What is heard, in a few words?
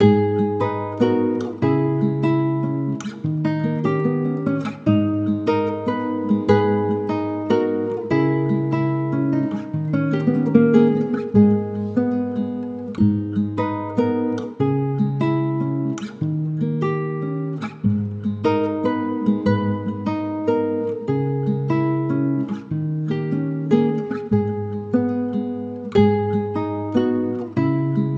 148 BPM G# Min, Guitar Loops; Diego; Cymatics; Journey Guitar Loops